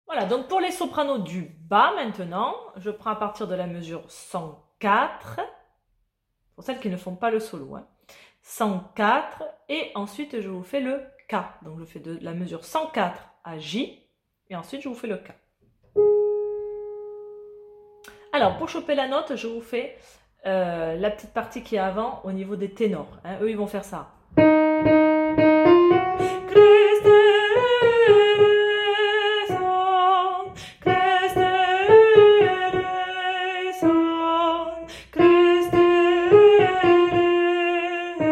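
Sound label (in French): soprano du bas; Kyrie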